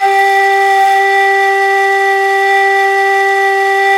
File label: NYE FLUTE; Nye Flutes